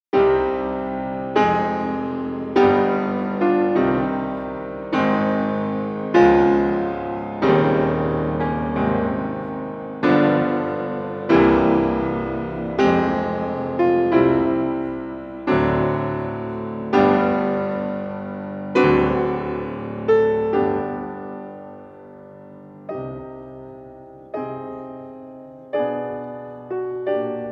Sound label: PRELUDE IN C MINOR; F. CHOPIN; КЛАССИКА